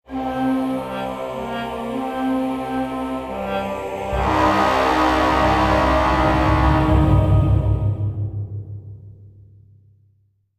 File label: Lose Battle; heroes of might magic v gamerip 2006; Sylvan